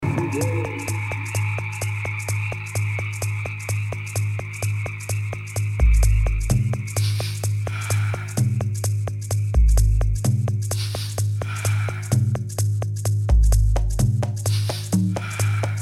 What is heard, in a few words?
Trance Drumming Circle